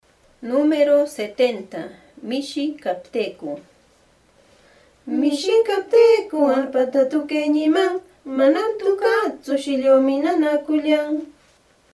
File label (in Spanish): cancion